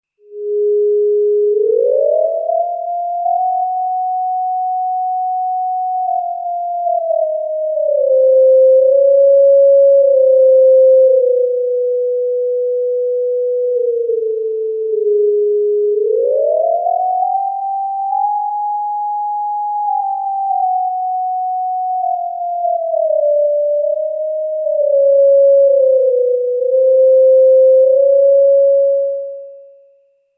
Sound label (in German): moog theremini strange stuff mit pc chromatic